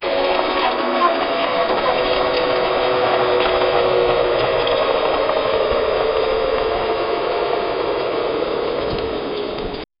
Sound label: electric sparks crackling; stabilizing